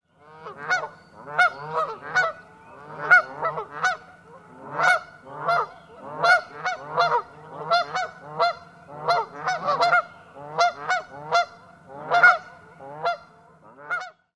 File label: honking of several geese